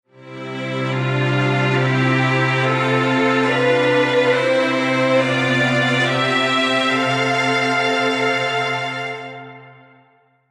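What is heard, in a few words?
Strings Polyphony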